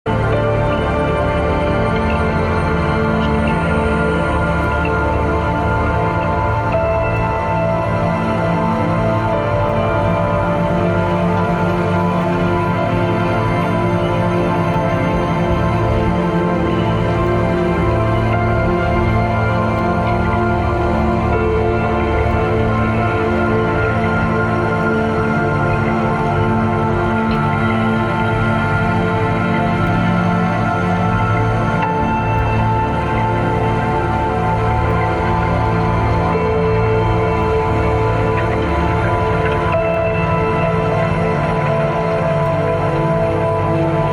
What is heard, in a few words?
pop ambient